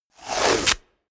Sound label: tear